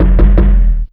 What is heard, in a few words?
Ragga & Dub Reggae PERC; USB Soundscan vol.27; AKAI